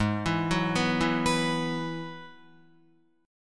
Ab Minor 7th Sharp 5th Ab, down, Guitar, 4E, 4A, 5D, 5G, 5C, 6F; arpeggiated; JGuitar